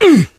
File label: melee swing, player survivor voice; source engine; left 4 dead 2